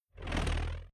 Whoosh Wizz By Cheese Grater Whip Spin Low Frequency Left To Right; Tom Hutchings